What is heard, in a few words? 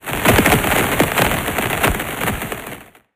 tree break; Nature-Weather